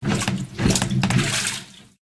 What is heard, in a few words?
plunger, Janitor